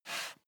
step barefoot wood plank slide short; Footsteps; schritte

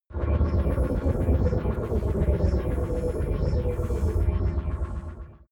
generator smooth spin down

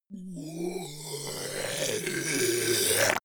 zombie grunt choke male long; kreaturen

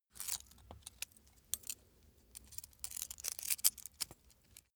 small metal handling scrunch; industrie wirtschaft; metall

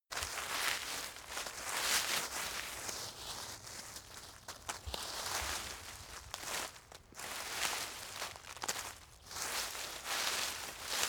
rustle foliage; berufe; foerster

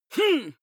male fight groan; vocals; comedy-cartoon; soundpool

